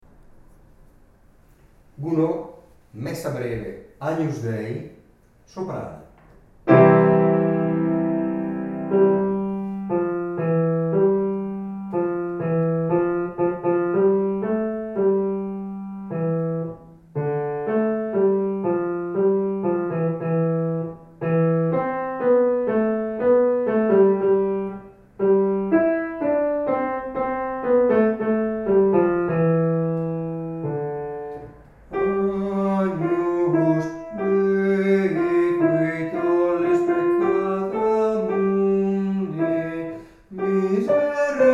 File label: Agnus Dei Soprani